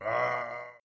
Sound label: Soul Death